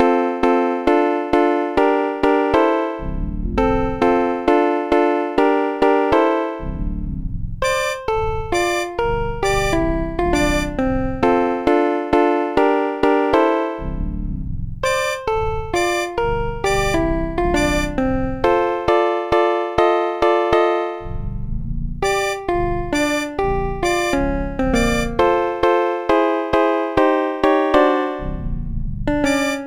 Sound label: harmony song, MIDI; The Music Studio